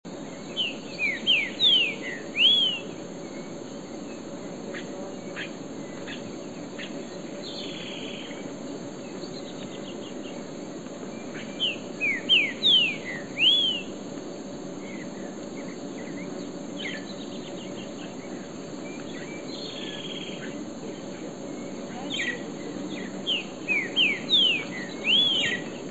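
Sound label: Pepitero Verdoso, Saltator similis, Green-winged Saltator; cantos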